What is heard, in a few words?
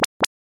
blink